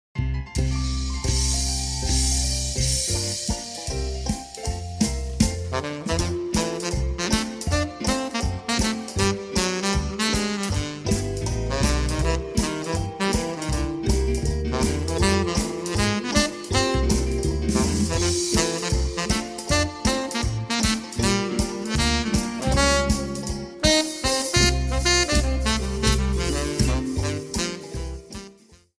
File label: Tenor